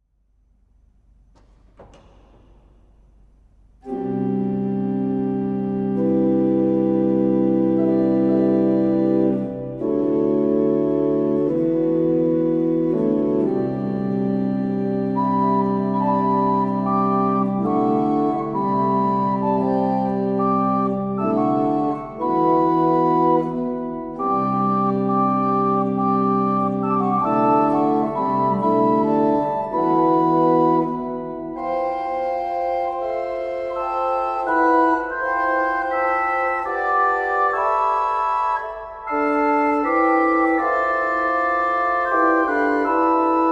Improvisation Flauti; 1600; Antegnati; Brescia

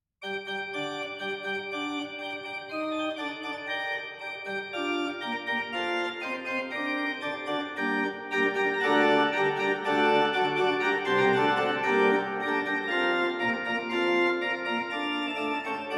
small plenum; Rabstejn

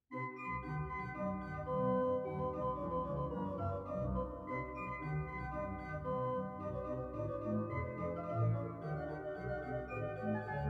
Buchholz; 1839; Brasov; Bach

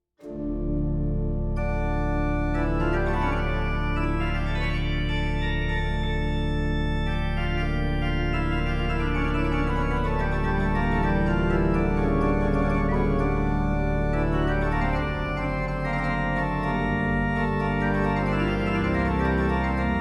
Reis Jeu de tierce; Rabstejn

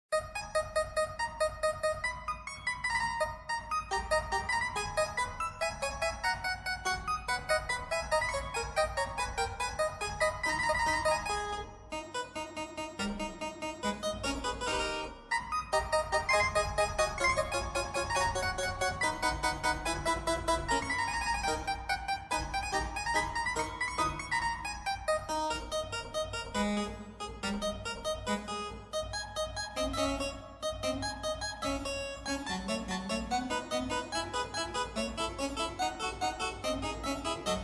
Praelude a Clavecin 4 solo; Vyhnalek

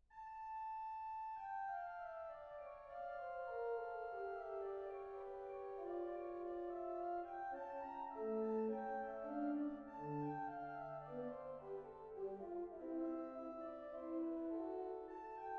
Improvisation Gemshorn; 2001; Grygar; Litomysl

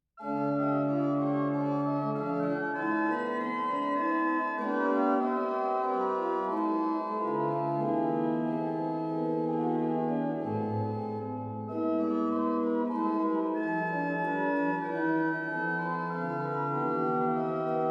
Improvisation Principale Ottava; Nakic; Izola

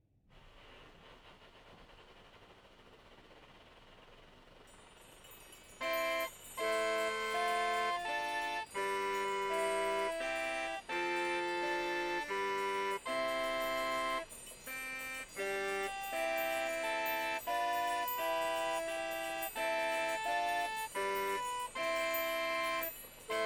Claviorganum; Sweelinck; Puer nobis nascitur

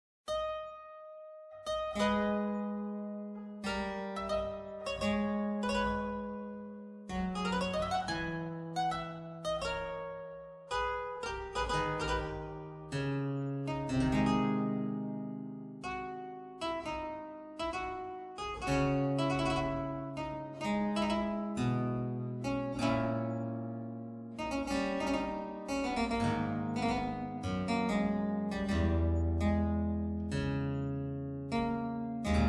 Praelude a Clavecin Lute solo; Vyhnalek

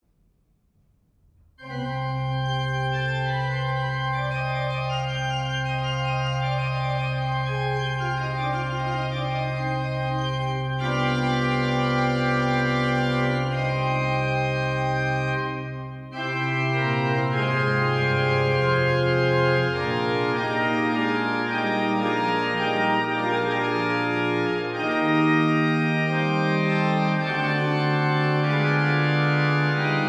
Clicquot Plein Jeu; Corrette

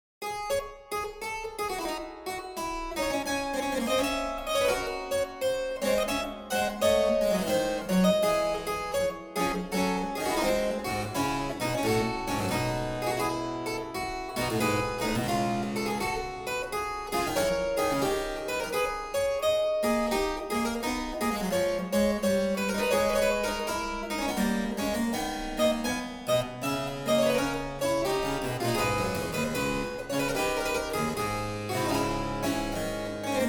French Suite; Mietke; Bach

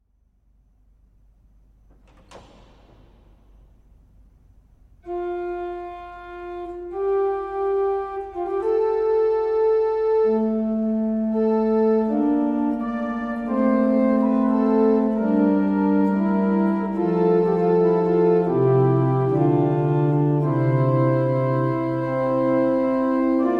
Antegnati Improvisation Principale; 1600; Brescia

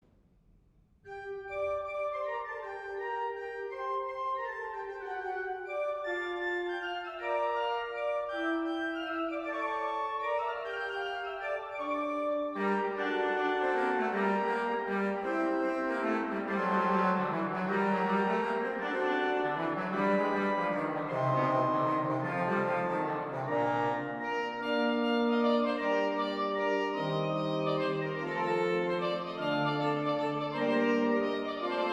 Clicquot Basse et dessus; Dornel

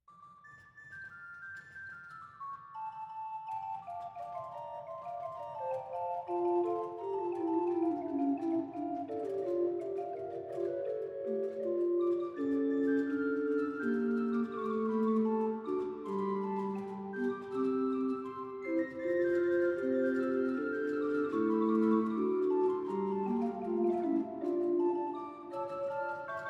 Flute; Rabstejn

